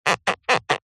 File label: plastic turn squeak creak; zapsplat